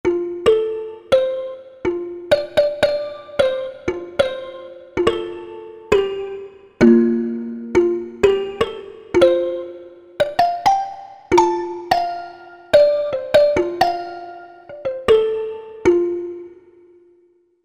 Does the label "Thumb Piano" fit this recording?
Yes